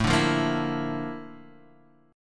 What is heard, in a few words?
A sharp Major Sharp 11th